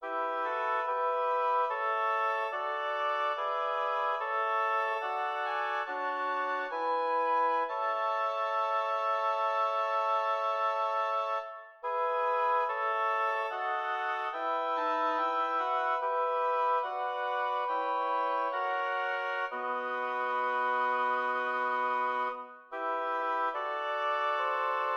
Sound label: lyrical oboe quartet